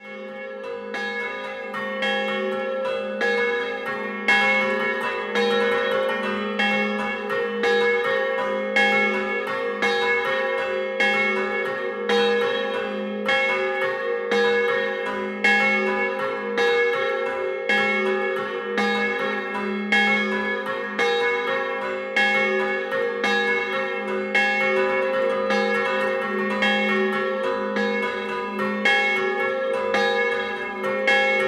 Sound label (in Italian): Scampanottata; Uccea; campane